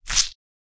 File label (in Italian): Carne Lacerata